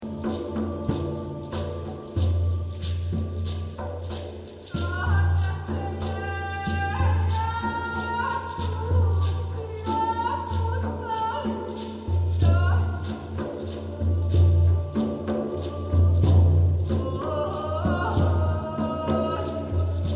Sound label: Middle Eastern Drum